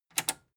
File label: metal button tiny click enable fast